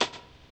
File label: RAGGA; Spectrasonics; Metamorphosis; RAGGAVYNL